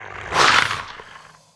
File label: attack